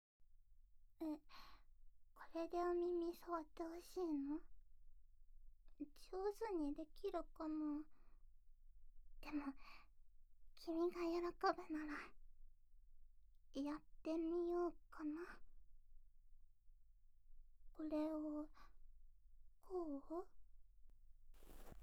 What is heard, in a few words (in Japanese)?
ブラシ耳かき